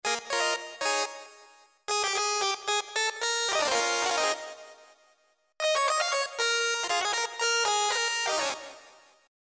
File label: old analog, yamaha tg500